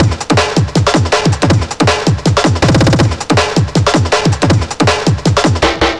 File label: 160, Drum Loops; Total Drum&Bass